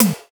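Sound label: TOM, drums; East West; Global Sounds; SPD11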